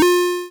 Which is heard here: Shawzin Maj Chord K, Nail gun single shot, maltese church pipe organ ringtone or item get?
item get